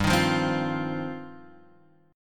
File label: G 7th Suspended 4th Sharp 5th G down Guitar Open F; G7sus4#5 strummed